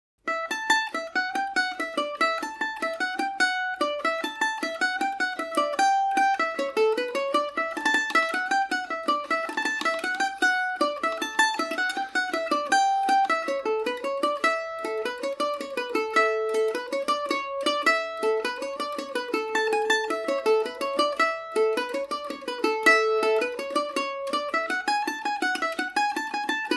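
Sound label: A mixolydian, Irish mandolin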